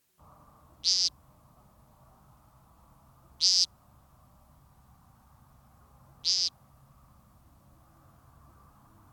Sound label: American Woodcock, Scolopax minior; Active: Spring